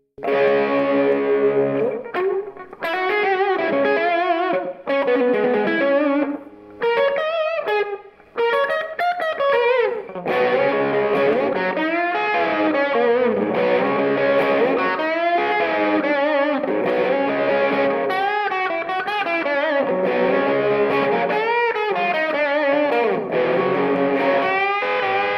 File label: modulation; UK MKII